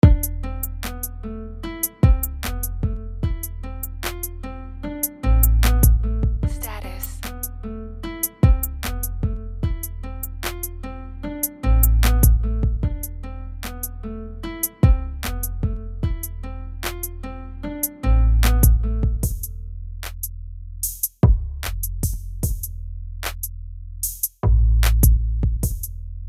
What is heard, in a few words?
guitar